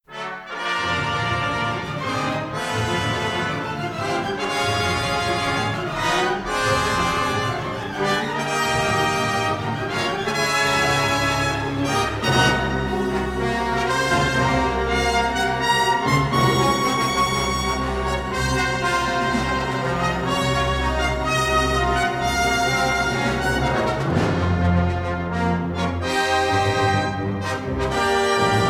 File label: Battle Sequence; star wars x wing vs. tie fighter 1997